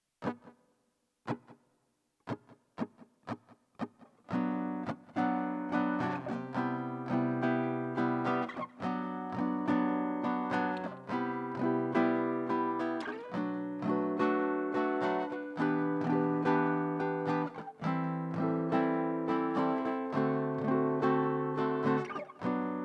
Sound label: Major Progression